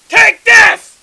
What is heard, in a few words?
throw; Opposing Forces; FSoldier